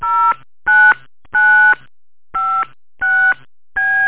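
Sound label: telephone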